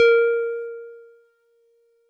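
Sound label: Wood Piano DX100 A#1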